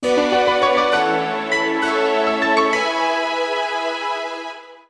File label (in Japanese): ジングル：詩人